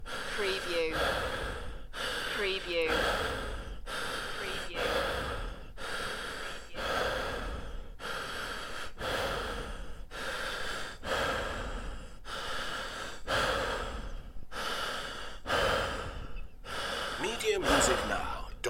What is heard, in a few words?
Breathing, Male, Moderately Out Of Breath